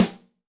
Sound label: Roll Rim, Yamaha DTXpress-II snare